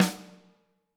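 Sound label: snare drum, Drums; Bob Clearmountain Drums I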